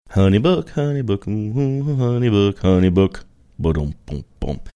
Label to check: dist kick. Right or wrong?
Wrong